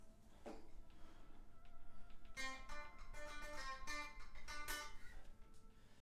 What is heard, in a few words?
flute